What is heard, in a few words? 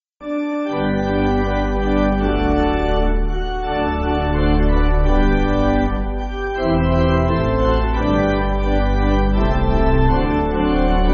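Pipe Organ, Backing Files for Churches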